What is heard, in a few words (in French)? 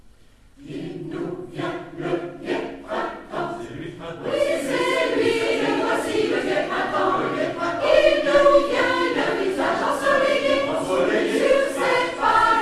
Fanfare du printemps